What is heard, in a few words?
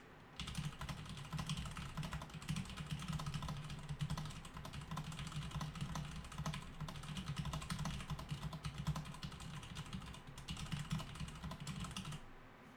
cherry mx brown